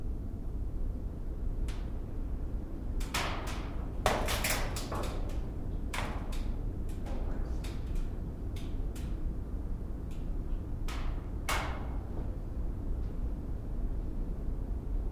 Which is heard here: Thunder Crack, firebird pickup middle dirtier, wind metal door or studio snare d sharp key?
wind metal door